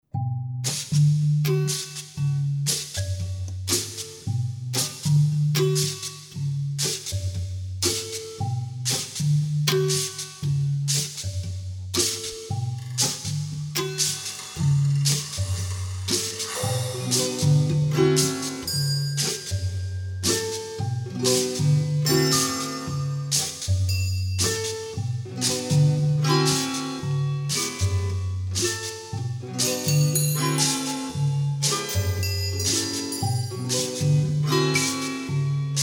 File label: ostinato